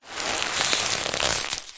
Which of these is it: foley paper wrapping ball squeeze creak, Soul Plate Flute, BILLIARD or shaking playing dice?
foley paper wrapping ball squeeze creak